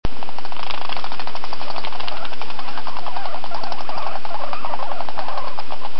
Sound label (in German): zaehnewetzen